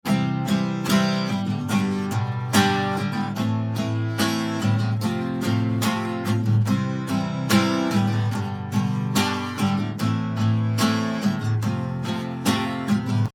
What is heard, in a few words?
acoustic guitar